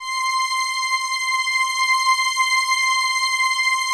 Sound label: PAD, DIGI-PAD